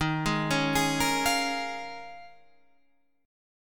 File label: D sharp 7th Suspended 2nd Suspended 4th D sharp; D#7sus2sus4; arpeggiated; 11,11,11,13,11,13; chord shape